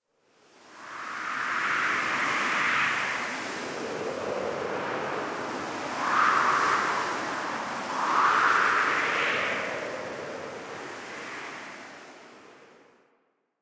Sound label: Wind Gust, Camp; Warframe; Quests; TheNewWar; Ambience; Drifter Camp